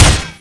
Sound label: tripwire gun; garrysmod